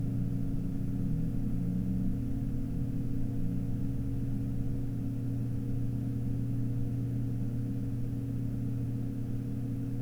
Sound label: 1000 RPM